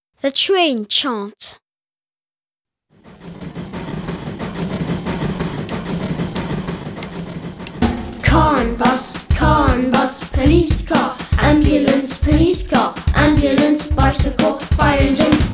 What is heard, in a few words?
train chant fast